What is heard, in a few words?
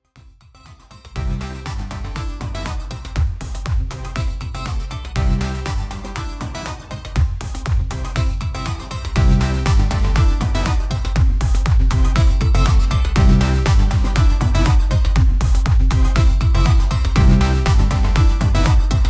electronic dance music